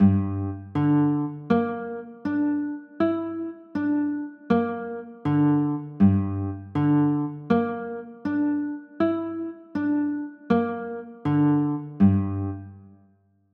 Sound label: g m6, chords